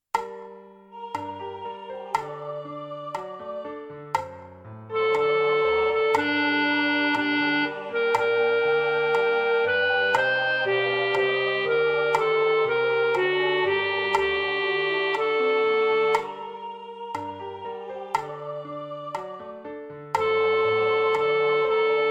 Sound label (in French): voix d alto; Rameau